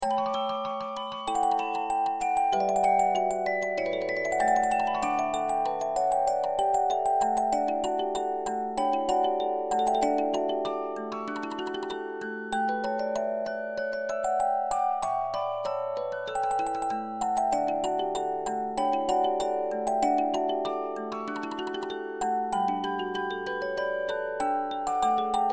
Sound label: Yellow Rose of Texas Simulation, music box 72-Note